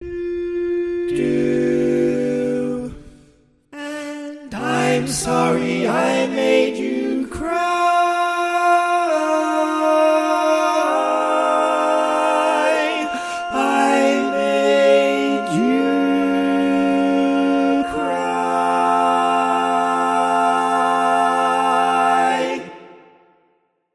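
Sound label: Baritone, barbershop tags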